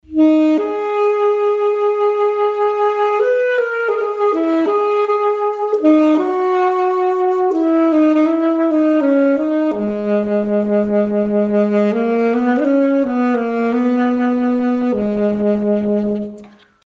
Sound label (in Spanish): saxo